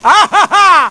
hihi

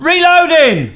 player reload; cstrike

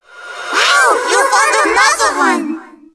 dark zm idle, zombie; zombie plague

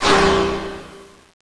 jail sound

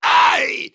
die; cstrike; biohazard

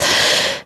Siren Prepare Scream; zombie plague

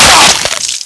headshot, weapons

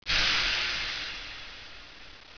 steam hit

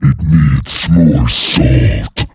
tank

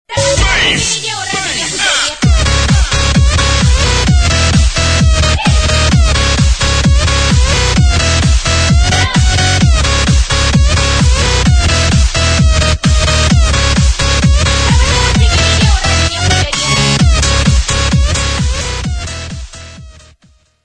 surf

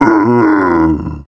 Scrake Infected; zombie plague

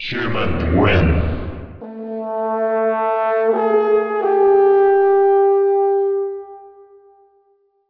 human win

